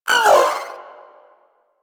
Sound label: Vocal Pain, Pets; Warframe; Zanuka; Zanuka Companion A